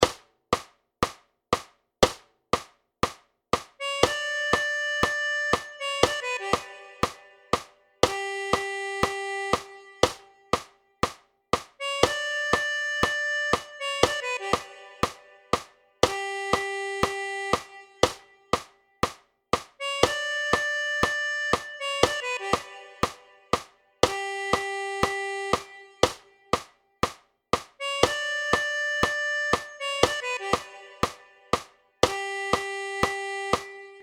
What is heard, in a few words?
riff